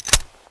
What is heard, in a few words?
rifle insert; weapons; sven; cryoffear